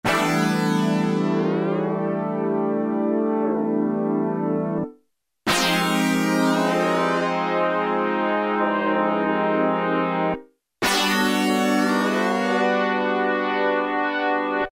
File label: BRASS DETUN2, Casio VZ-10M; Internal factory combi